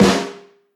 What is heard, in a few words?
warm sounding acoustic snare sound g key